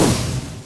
range projectile impact; weapons; dota; creep

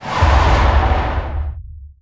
starstorm impact; weapons; dota; mirana

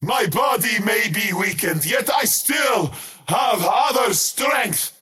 skywrath crown cast pain; skywrath mage

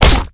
ursa attack

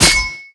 ring, large blade; weapons; dota

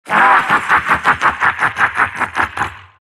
silt laugh; dota; siltbreaker

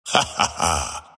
laugh; dota; ember spirit

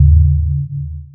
magnetize pulse; weapons; dota; earth spirit

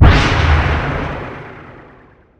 repulsion; dota; disruptor

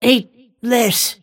attack pain; snapfire

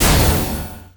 shotgun target; weapons; dota; snapfire

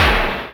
projectile impact; dota; dazzle

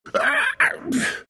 pain; dota; night stalker

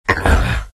pain, vo; arc warden

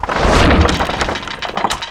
engine attack; weapons; dota; creep

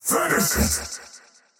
attack pain; dota; faceless void

